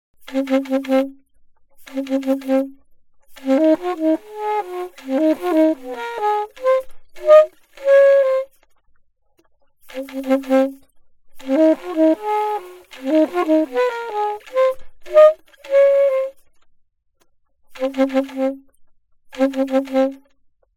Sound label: altosax